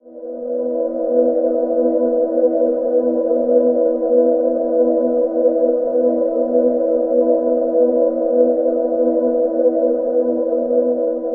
Misty E, Ambient Pads; Zero-G Seismic Frequencies; 90 s Sample CDs

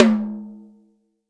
TOM, Real Drum Kit; REAL DRUMS; ROCK KIT

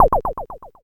Lop Snap; Quasimidi Rave-o-lution 309

